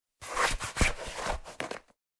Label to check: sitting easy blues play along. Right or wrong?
Wrong